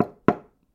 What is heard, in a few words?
knock on thin board; knocking